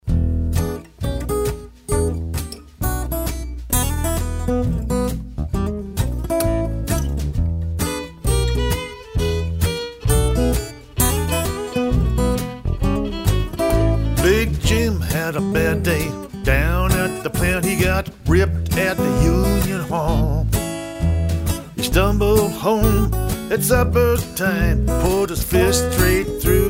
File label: Playing Big Jims Blues